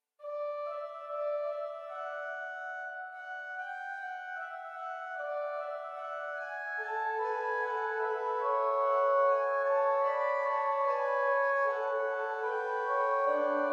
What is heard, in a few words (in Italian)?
Organo; 065 Al tuo santo altar